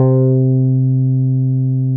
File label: BROWN OU C4, Boom da Bass; Boom da Bass 3; E-MU Producer Series Vol. 6 – Analogia Project